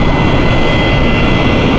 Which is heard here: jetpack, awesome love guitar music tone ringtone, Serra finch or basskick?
jetpack